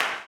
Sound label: CLAP, Blues Soul; Spectrasonics Vocal Planet